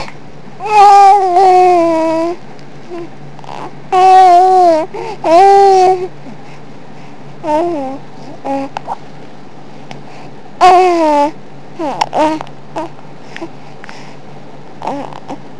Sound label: Cooing